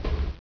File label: footstep metal; footsteps; gearbox